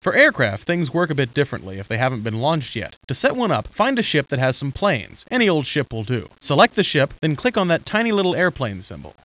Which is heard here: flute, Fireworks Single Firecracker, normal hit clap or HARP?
HARP